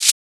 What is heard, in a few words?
Shaker